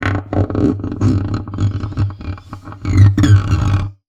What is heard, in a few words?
Classical Guitar Extended Technique